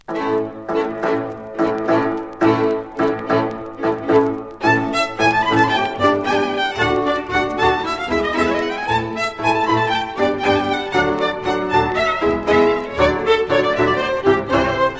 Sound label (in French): Lunga et Hora rapide, Roumanie